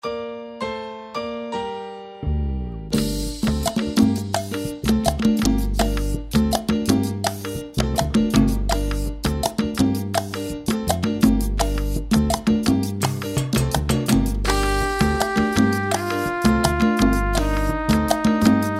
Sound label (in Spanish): Villancico de las Campanas